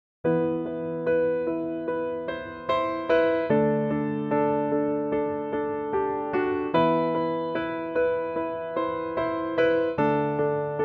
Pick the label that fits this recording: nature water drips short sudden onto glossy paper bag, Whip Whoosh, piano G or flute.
piano G